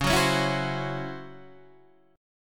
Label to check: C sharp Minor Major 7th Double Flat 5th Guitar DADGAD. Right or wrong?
Right